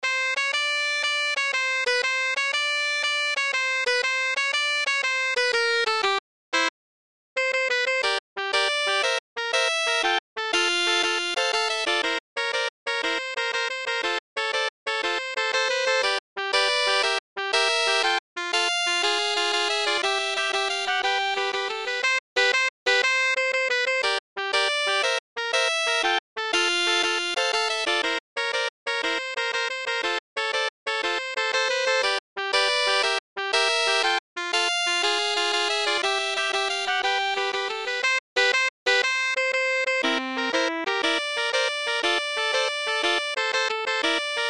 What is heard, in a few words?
March - Sax Trio